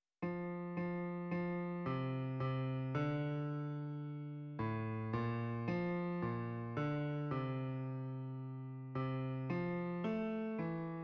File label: Bass